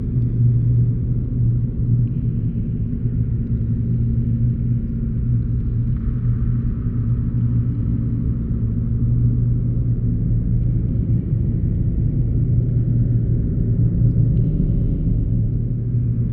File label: drone interior; Counter Strike Model Pack; CS:GO; aztec2